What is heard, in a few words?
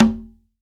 CONGA, STEREO CONGA; AKAI S-Series CD-ROM Sound Library VOL-5